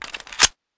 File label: p228 clip in; czero opforce